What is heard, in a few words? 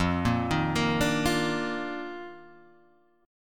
D sharp Major 7th Suspended 2nd F